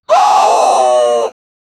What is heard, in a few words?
death clench